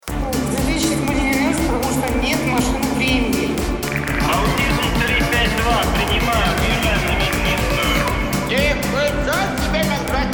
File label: tick